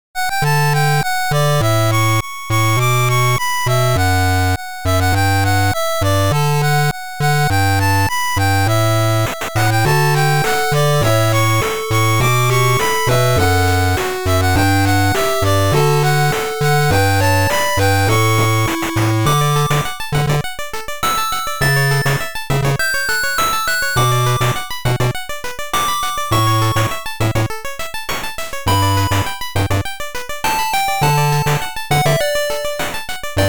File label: 8bit